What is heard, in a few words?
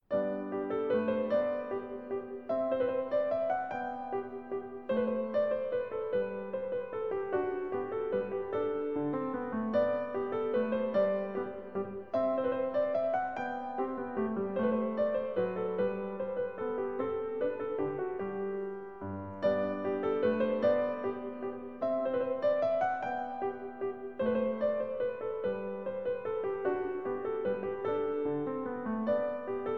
Minuet In G Major